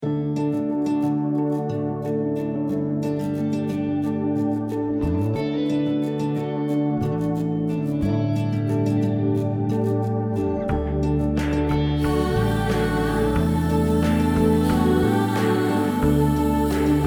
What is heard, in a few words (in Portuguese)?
salmo playback